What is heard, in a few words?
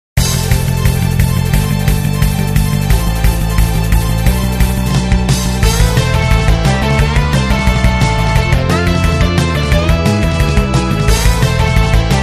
battle forest; Monster Pinball Ultra Flashy Tensile Battle Game; Chronus App Inc; Android